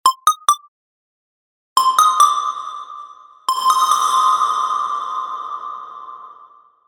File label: Reverb